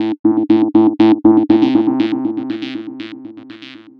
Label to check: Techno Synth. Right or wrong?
Right